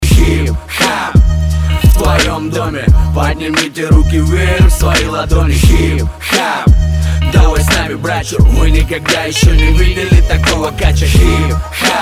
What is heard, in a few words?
hip hop